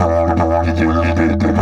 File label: 150, DIDGE RIFFS